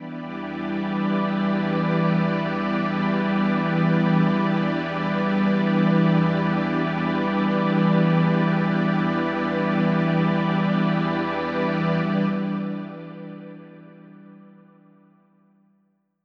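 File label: Ambi String E minor 7, synth orchestra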